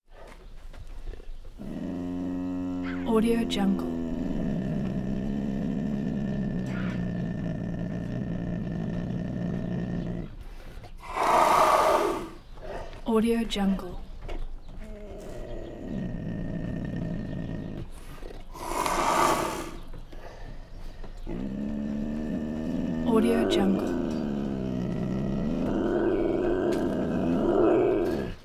puma constant long wary growls; prosoundfx

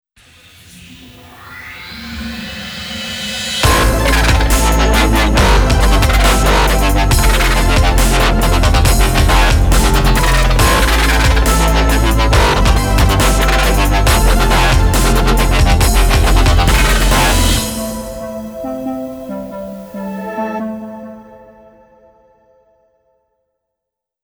abstract dubstep ident logo

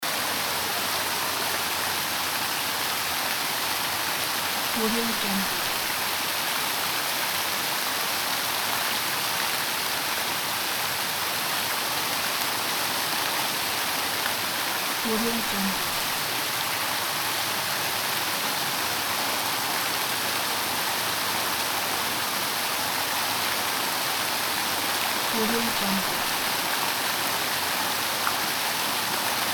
large fountain; alanay

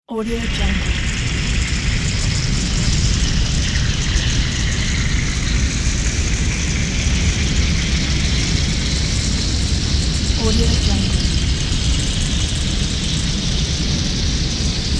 crematory ambience

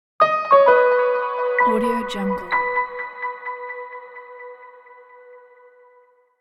crystal clear logo; gold-point

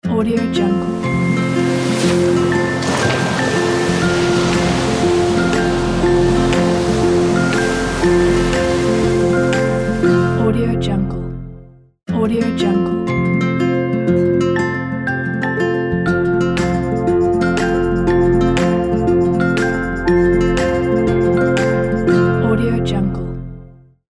elements water ident

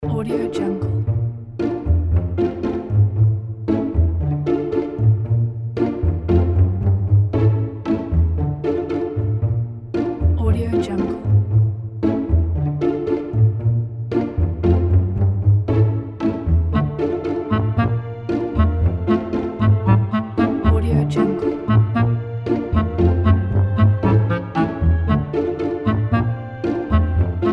quirky comedy background

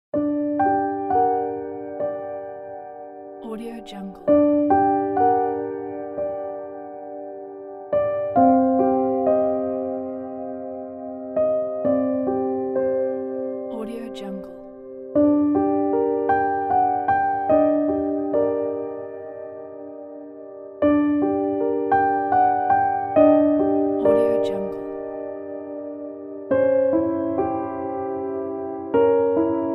sad melancholic piano

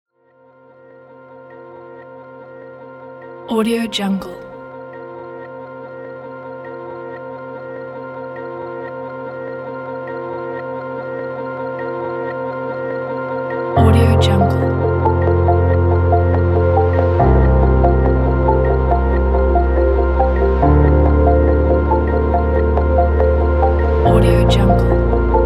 inspirational ambient background; uransound

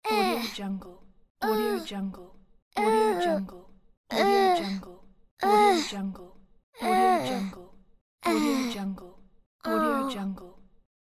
little boy grunting uh

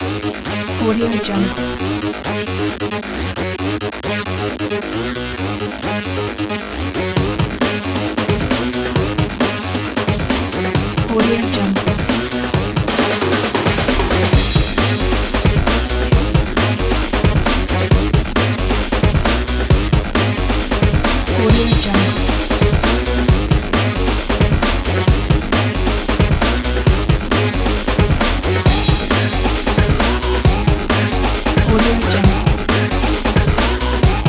aggressive 90s breakbeat

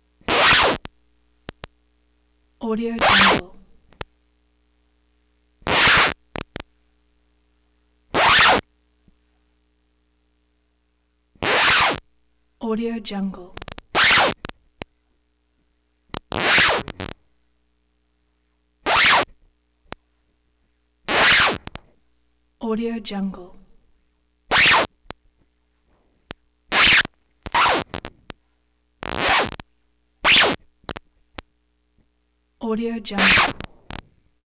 record scratch and rip

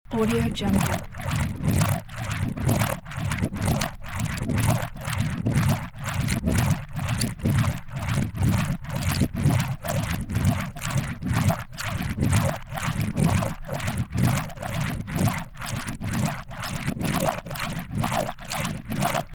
squashing spinning polishing machine loop

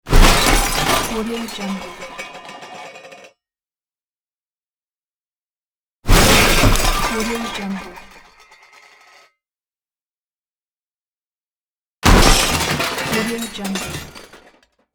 crash; noisesonic

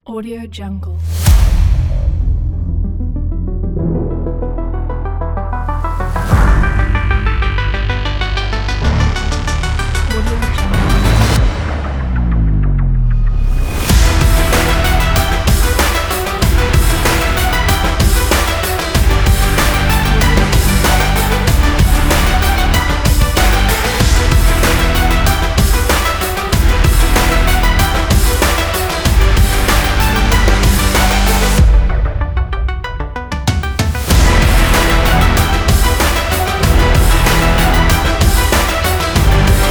motivational energetic breakbeat

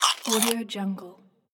eat popcorn

